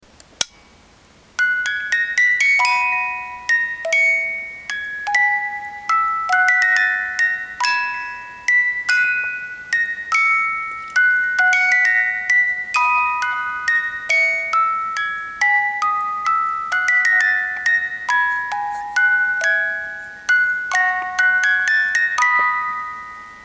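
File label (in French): blumenwalzer 18 lames, boite a musique; Boîte à musique suisse, type presse papier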